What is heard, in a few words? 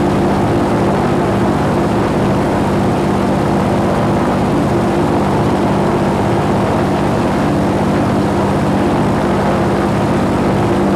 plane; World of Tanks